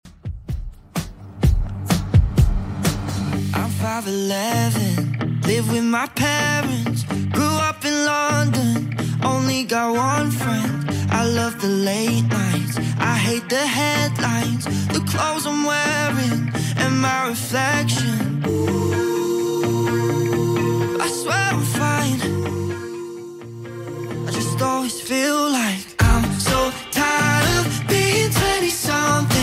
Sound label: Top40 Redrum 127bpm